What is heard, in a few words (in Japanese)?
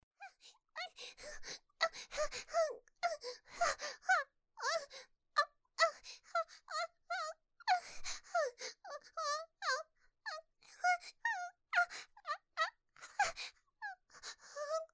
喘ぎ 幼 中